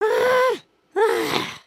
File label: frustrated, emotes